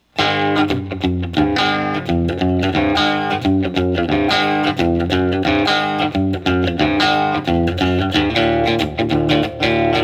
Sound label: Guild NS X175B, Guitar; guild 2015 newark street x-175b guitar